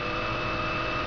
wheelchair walk; svencoop; decay

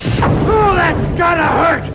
hurt

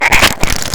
scratching; svencoop; rustworld

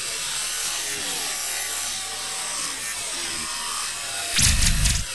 dojo

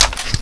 open, sawedoff

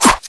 knife hit body

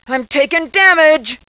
attacked; svencoop; decay

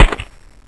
glock fire sil

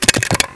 cylinder in

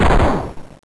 glock fire sil

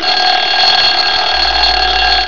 Bank Door Alarm; fisk city

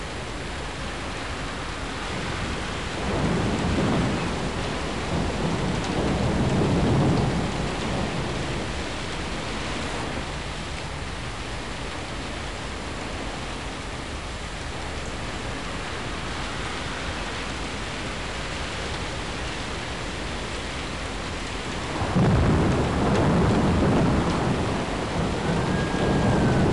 Ambience; Fisk City

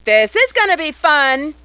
attack; svencoop; decay

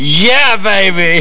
baby

